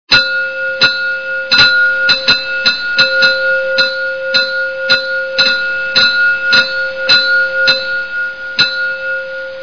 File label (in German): bahnuebergang